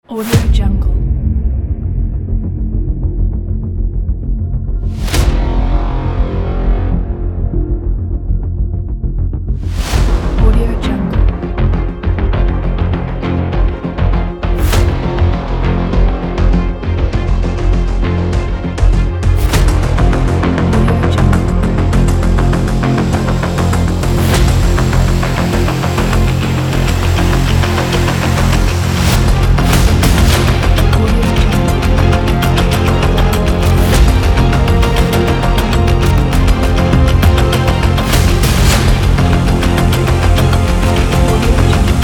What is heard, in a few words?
teaser trailer